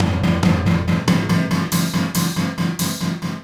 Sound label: Ripple Perc